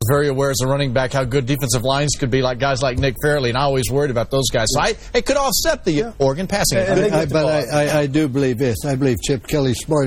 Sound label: aggressniff